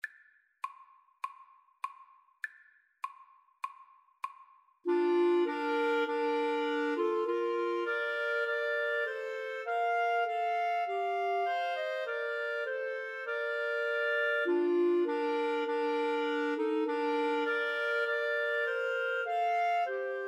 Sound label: Clarinet trio